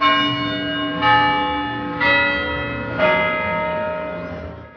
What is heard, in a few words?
big ben